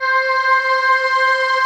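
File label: slow orchestra pad, Slow Pad 5th; Optical Media International; Sonic Images Library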